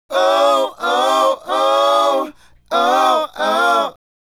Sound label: Male Group, Hip-Hop and RnB Vocals